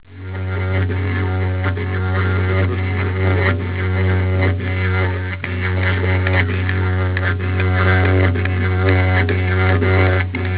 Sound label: didjeridu